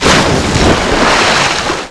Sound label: SPLASH; mtm2demo; PC Gamer 4.2